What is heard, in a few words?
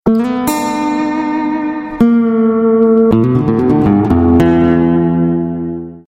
Blues Riff